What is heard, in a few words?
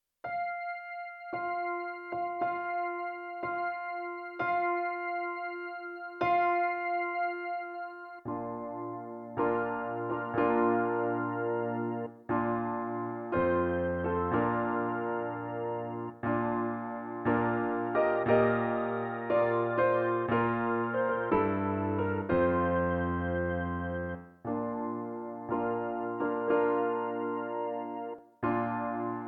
Bridal Chorus, piano